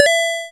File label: Gnome Collect